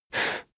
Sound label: male breath fast in